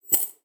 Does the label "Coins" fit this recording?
Yes